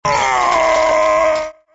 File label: death; hl2dm